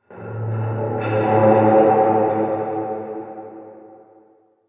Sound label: metal sfx; cstrike; metall sfx; mansion